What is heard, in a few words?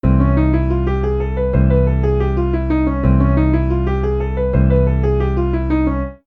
super augmented ii v7